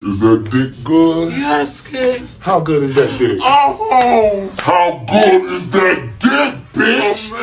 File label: yes king ahhhhhhhhhhhhhhhh